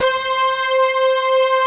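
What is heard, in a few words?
Obie Stack, Slow OBlip; Optical Media International; Sonic Images Library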